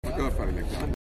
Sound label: loop